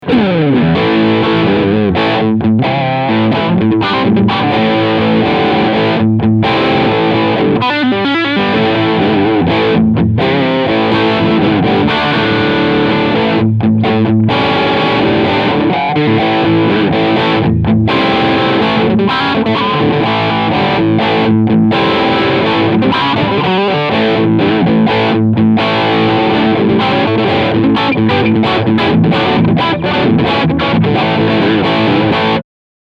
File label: Riff Overdrive; Steg